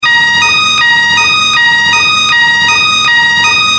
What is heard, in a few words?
horn